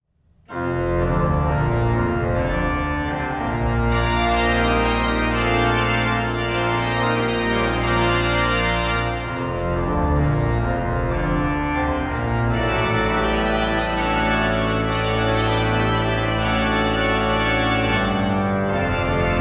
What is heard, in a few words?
organ